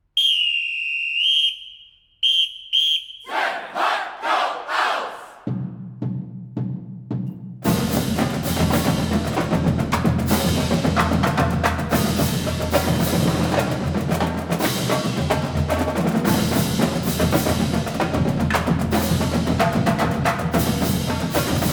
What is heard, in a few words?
run on and fanfare